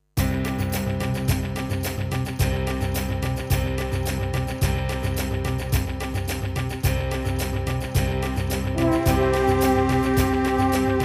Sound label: French Horn